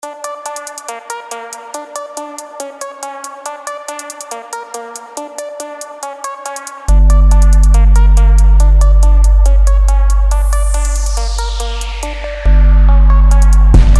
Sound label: Instrumental